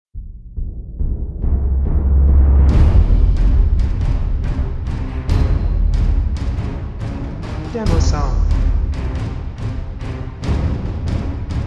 Age of Darkness No Choirs

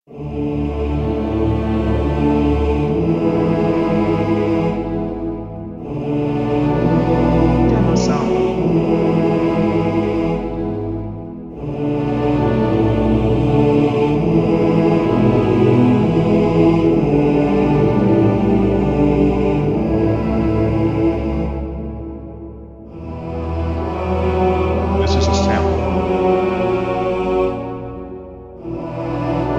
Holy Sanctuary Monks and Heavenly Choir

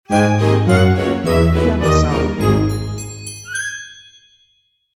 Stinger

